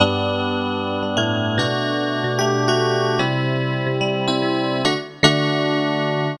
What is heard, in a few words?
Pop Piano